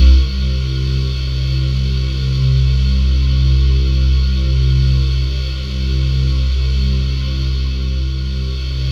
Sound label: C2, Synth Leads